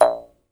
jaw harp, Pitched Percussn, Jaw Harps

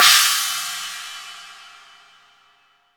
MIX CRASH, MONO CRASH

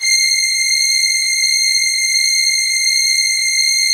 HIGH STR, House Dance; 90 s Sample CDs; Best Service Dance Mega

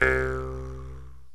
JEW'S HARP

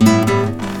GTR CHUCKA, Nylon Guitar

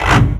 clutch; Sonic Foundry (Sony Creative Software); Ugly Remnants VOL-2; Beatstuff

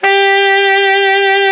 ALT SAX, D3; Best Service; Alpine Volksmusik

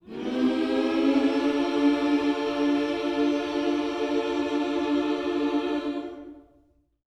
MM MINOR, Gospel

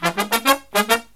Brass Riffs; USB Soundscan vol.29 - Killer Brass Riffs; AKAI

